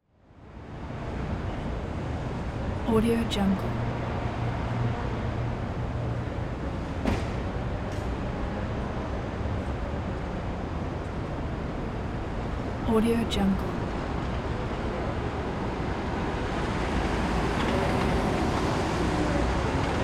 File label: new york city 7th avenue 42nd and broadway